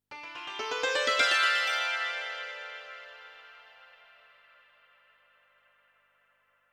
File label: ethno, 125, A